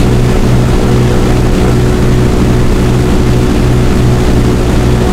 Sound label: blenheim cockpit r, engines; War Thunder